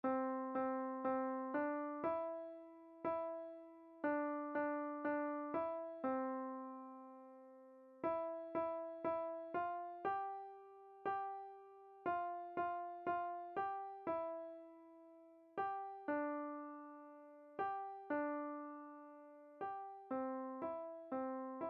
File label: play piano by ear; The donkey